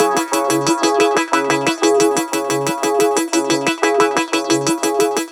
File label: SPD SX Scape